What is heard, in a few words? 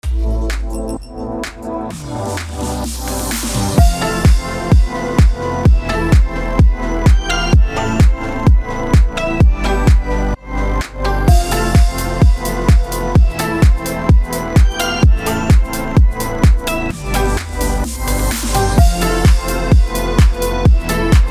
BED HALLOWEEN EDM FMIN 128BPM